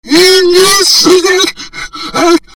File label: scream, zombie screams